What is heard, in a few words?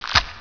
chum toad step